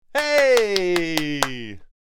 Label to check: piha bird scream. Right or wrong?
Wrong